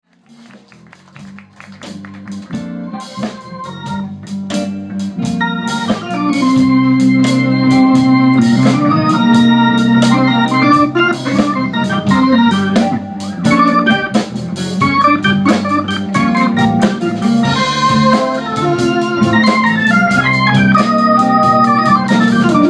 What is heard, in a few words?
organ solo